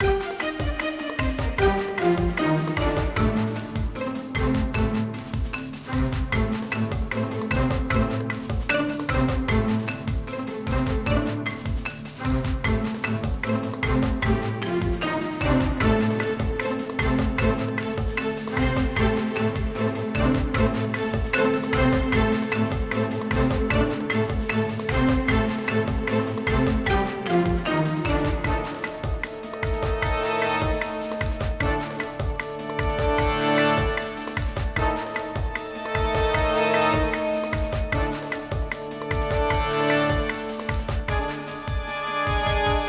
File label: music loop; rockbottom